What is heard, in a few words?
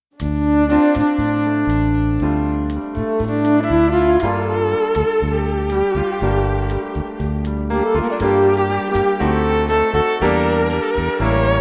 Keys with rhythm loop and Violin; Cocktail and Dinner Music